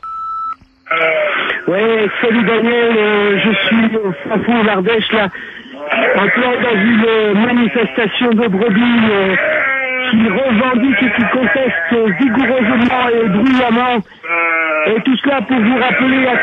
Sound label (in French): manif Ardeche; Reportable manif 4 oct 05